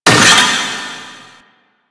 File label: Vaults Stake Gate; Warframe; Entrati